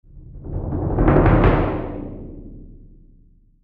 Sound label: Bass drum rolls crescendo